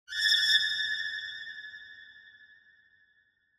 Symphonic Hit Jarring Violins Calls - Short Two Calls; Hollywood Edge; sound designer's toolkit v2